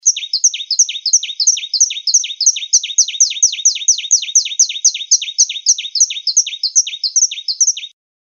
Canto Canario da Terra Carretilha

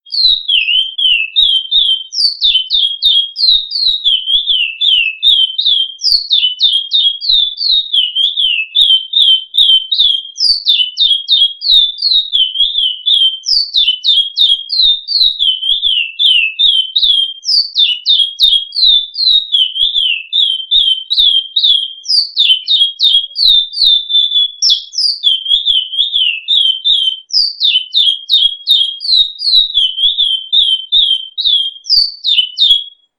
Canto Curio Florianopolis

